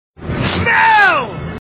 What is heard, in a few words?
smell yell